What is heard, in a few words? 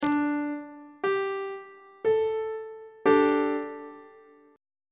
c double sharp suspended 4th chord on treble clef